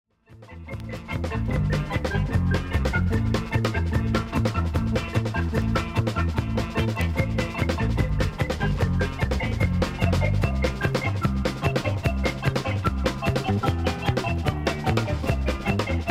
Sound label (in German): hammond schlager